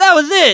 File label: celeb; ESPN Pro Boarder